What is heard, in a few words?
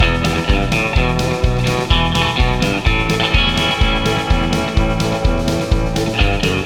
music boss